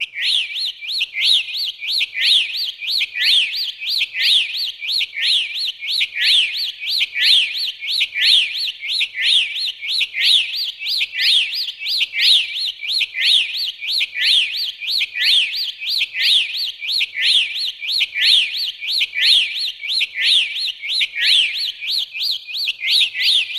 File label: bird tweet